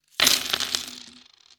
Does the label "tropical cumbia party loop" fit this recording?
No